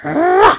Attack Long; Sly Attack Long

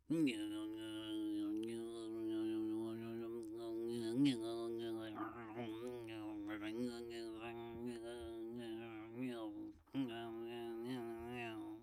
Zoteling Loop

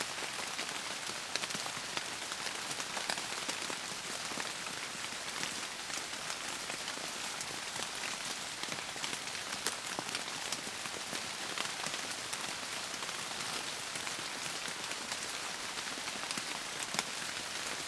Light Rain; battle suit aces

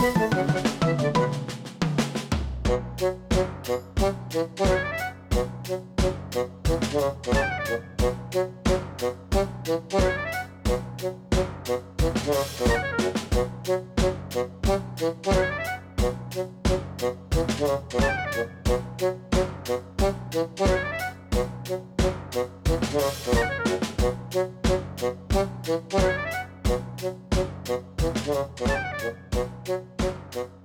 Jingle Puzzle Mistake; Pbff Music#57 (Jingle Ig Puzzle Mistake)

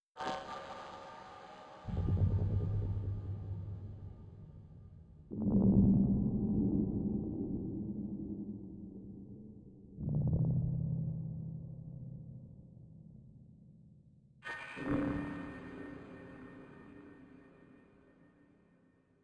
Music Hub Layer erosion disto; Music Hub Layer erosion disto 07

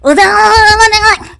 Wild Slash; Lurker

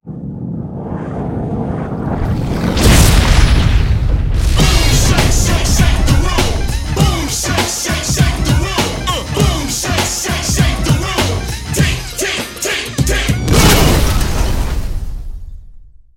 Boom; Touristtrap; Burnout CRASH!; Xbox 360

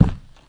Foot Step Orc

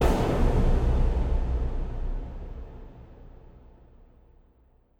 Cpatain Ghost Hit

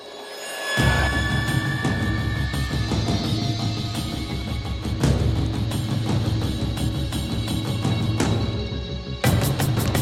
Chase Loop; unearthed trail of ibn battuta episode 1

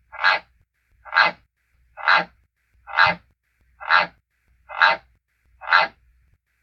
croaking; Deebie

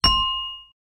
bell puzzle

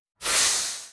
Mech Footstep Air; starbites

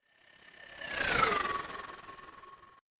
Spell Drone; Engineer

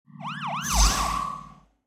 Find

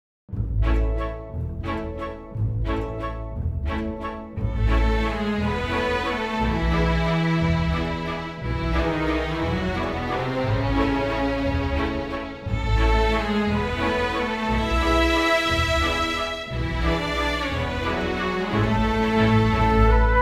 B Menu Event

